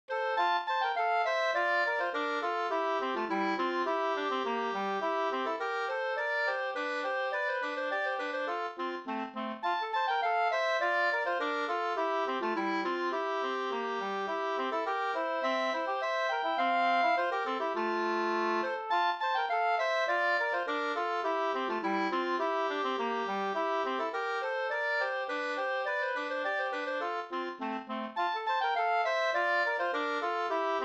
snoa

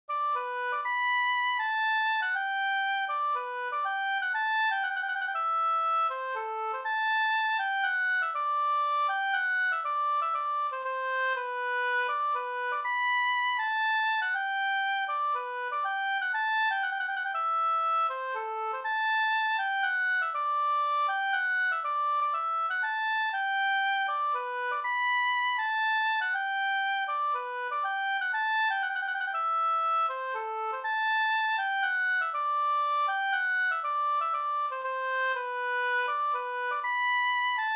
polska Bodapolska

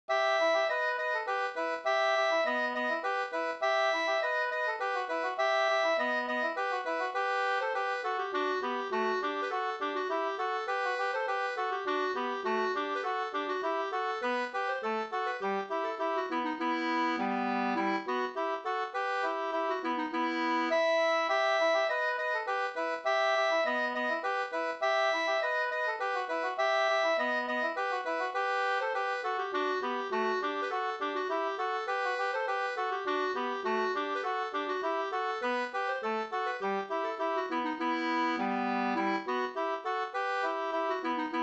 slangpolska

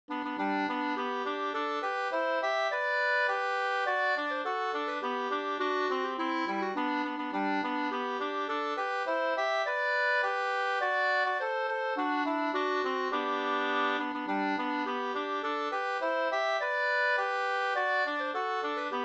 slangpolska

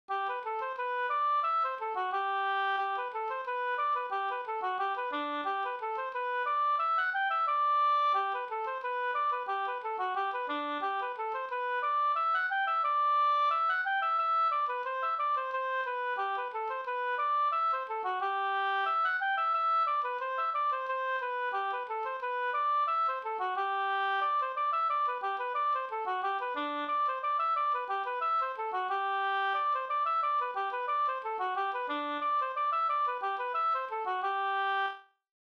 folkdans; Astorpastycket

